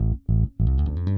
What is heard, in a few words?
Stingray, Rock Bass; Old Grey Stingray